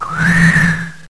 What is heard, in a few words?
Ominous Wind; pokemon reborn e19 2022